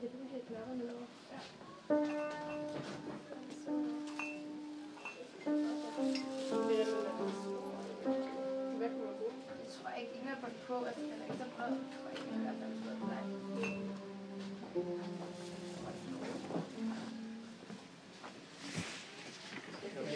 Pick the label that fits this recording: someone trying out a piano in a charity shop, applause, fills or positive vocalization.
someone trying out a piano in a charity shop